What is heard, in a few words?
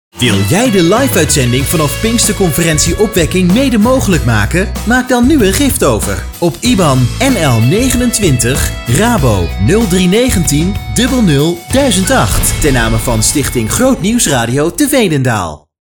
streamopener; opwekking 2015